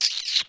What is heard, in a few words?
Mouth Fx, Vocal